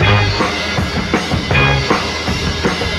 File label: horns stabs included at no charge, funky drum loops